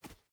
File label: footstep hide dirt jump start; Rust